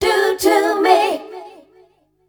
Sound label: FEMALE CHOIR, Dance Vocals